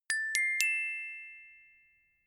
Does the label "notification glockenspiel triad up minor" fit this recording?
Yes